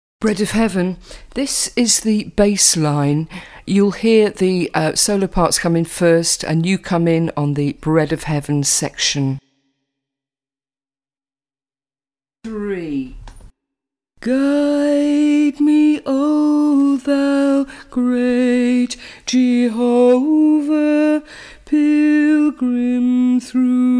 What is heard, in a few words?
bread of heaven bass, gospel